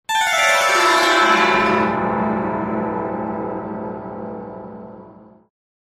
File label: acoustic hammered dulcimer